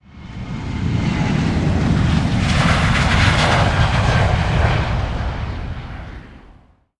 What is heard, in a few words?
airplane fly over